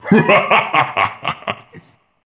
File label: Evil Laugh